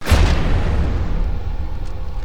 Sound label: sky sound air sound